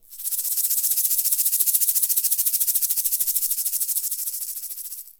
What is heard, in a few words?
SHROLL, Percussion; Sampleheads; NYC Percussionworks; 90 s Sample CDs